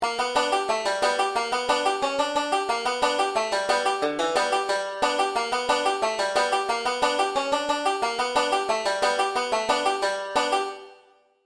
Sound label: cripple creek chorus version 2 180bpm, banjo; beginning 5 string